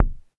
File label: polyvox bassdrum, Drum Machines, Formanta Radio Plant Polivoks